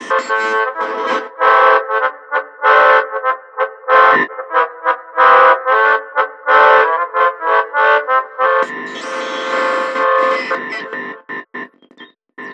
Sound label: Gramophone; Call of Duty WWII; PS4 Windows Xbox One